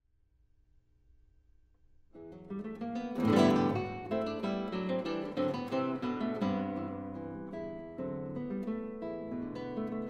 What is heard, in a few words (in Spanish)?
Sonata Para Guitarra Allegro Moderato